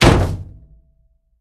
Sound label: Humans Damage; car simulator 2